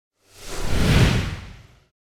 Whoosh By Smooth Heavy Fast; Hollywood Edge; sound designer's toolkit v2

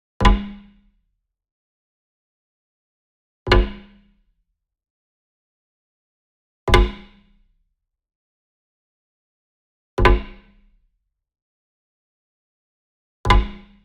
Wood Percussion Log Drum Flam Near; boom library; Cinematic Strikes Construction Kit

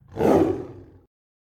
Tiger Makes Loud Hoarse Roars and Whines; Tigers; Sound Ideas

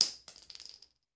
Bullet Shell; Sound Ideas; Guns

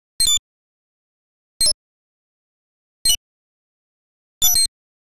Generic Click Digital

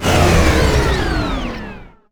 Machine Turbine Powerdown; Machinery - Generators

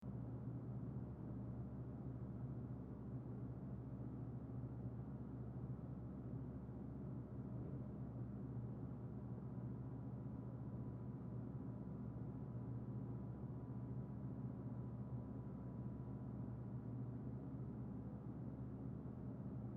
apartment hallway; blindside

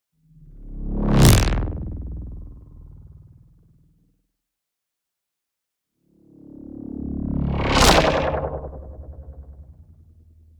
NEON WHOOSH LARGE SLOW Filter Growl; boom library

